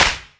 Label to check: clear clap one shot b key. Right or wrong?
Right